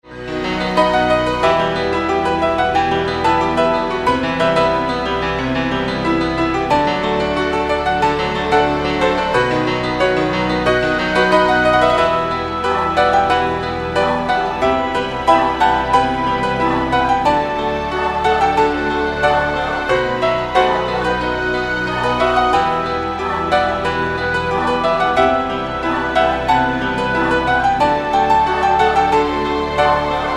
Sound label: classical